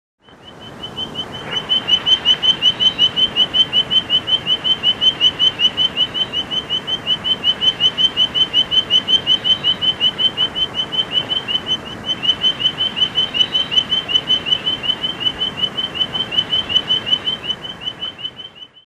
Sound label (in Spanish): Ejemplo de sonido, THE CALLS OF THE FROGS OF MADAGASCAR; Fonoteca Zoológica